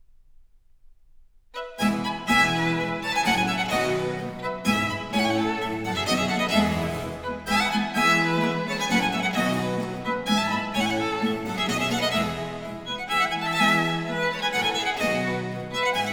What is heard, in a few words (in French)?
Pièces de violes, Suite in F Major; Book 4, No. 30-32; Nicola Matteis; Ayres for the Violin; Quatrième Livre (Paris 1717)